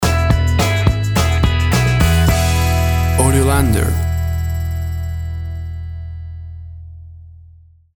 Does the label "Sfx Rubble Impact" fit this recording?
No